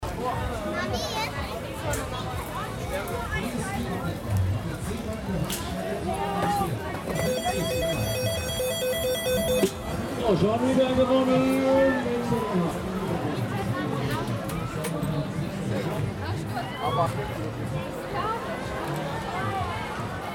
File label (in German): Rummel Menschen Ansage Grosses Pendel